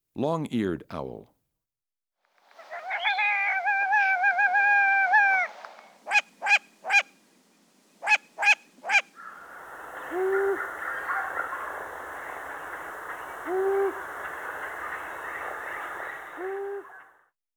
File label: long eared owl; Peterson Field Guide to Bird Songs (Eastern/Central US)